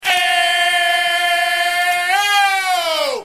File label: ehhhohhh